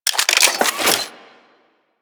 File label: Arch Rocket Crossbow Idle Mech, Weapons; Warframe; Archwing